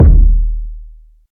smooth bottom end kick drum D# key

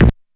prominent bass drum sound b key

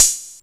muffled closed hi hat sound A key

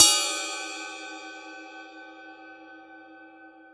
ambient ride sound sample f key

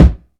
boom kick drum a sharp key; kick drum samples

